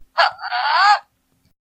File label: Wiimote Sound; Link